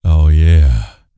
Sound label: Vocal Planet R&B Dance BASS CATCH; Spectrasonics Vocal Planet CD4